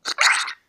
Spit

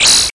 robotic bird

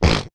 Nose

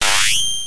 Shiney

